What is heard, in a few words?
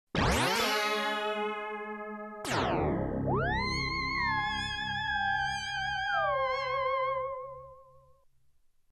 twang